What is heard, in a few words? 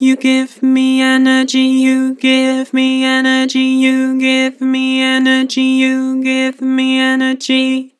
Vocals Flat Give me energy; Harmor